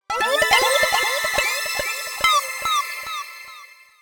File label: alarm clock elapsed